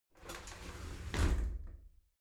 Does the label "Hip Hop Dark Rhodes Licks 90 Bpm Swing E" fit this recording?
No